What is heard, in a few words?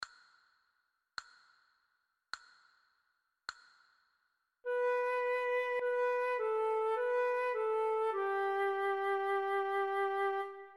Leise rieselt der schnee, flute a bec; methode pour apprendre a jouer